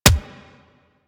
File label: Weapon Punch Shotgun